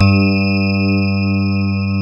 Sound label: GRAND PF G 3, AKAI S-Series